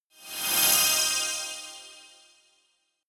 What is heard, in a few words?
sweep